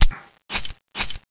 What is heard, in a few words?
usp silencer on, weapons USP